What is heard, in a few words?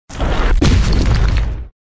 Sfx Enemy Golem Earthslam